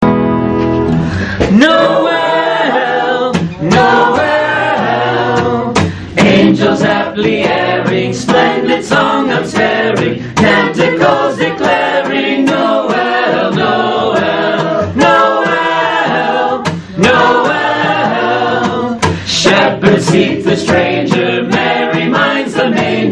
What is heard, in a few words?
heavy on tenor; penta metra noel